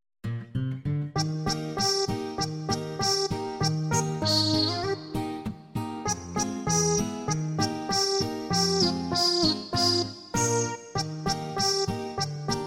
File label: jingle bells chinese